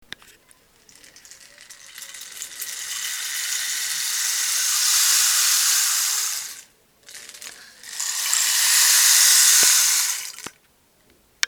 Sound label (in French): bâton de pluie